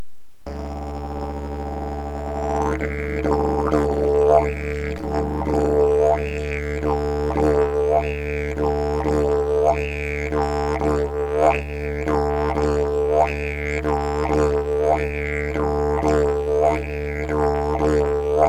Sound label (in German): Hoerprobe Unterkiefer mit Zungenspiel, didgeridoo